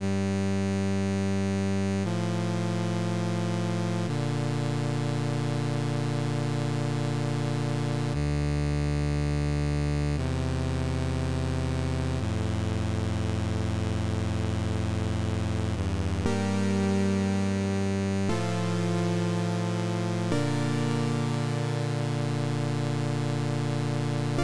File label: 8-bit